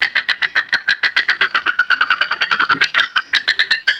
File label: rapid guttural pine marten chattering; animals